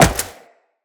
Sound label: Tenno Wall Jump Metal, footsteps, wall run; Characters